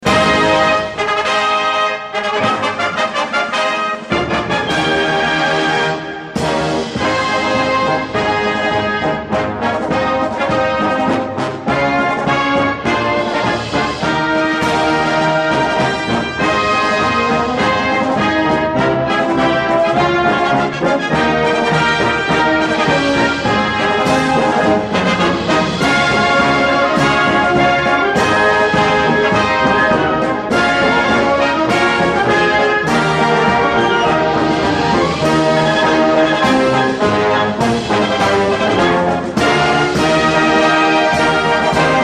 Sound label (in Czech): Hymna